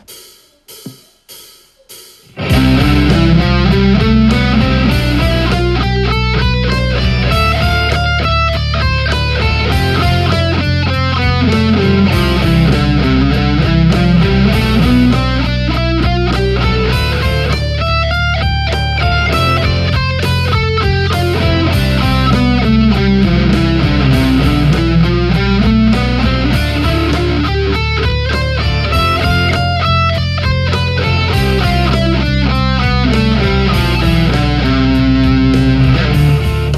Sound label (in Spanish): corcheas backing track